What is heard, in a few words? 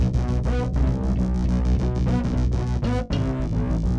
bass; musdb18